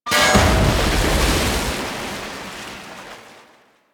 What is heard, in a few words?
huge tank explode; Effervon